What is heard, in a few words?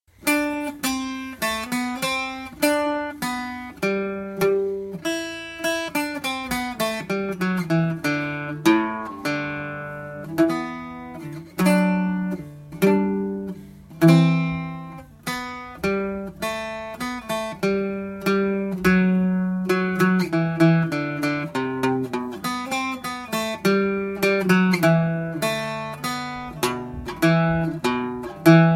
contrechant B, banjo